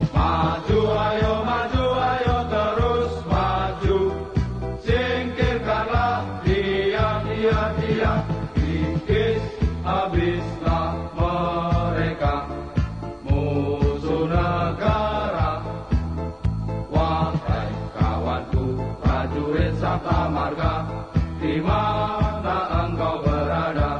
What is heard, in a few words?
indonesian military